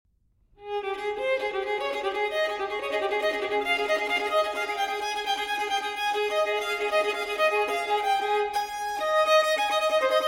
Fantasia For Solo Violin in A Major, Allegro – Presto; No. 5; TWV 4018; Telemann Fantasia